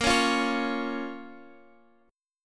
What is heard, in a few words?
Bb Minor, Guitar, Standard; 18,16,15,18,x,x; strummed; chord shape